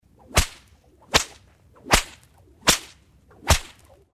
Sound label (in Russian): zvuk knuta